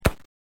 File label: MUSKET